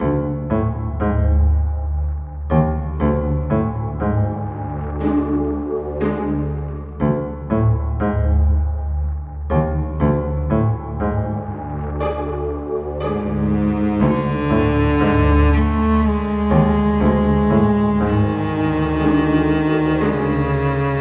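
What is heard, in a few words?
Villain Interior Intro; Eternal Radiance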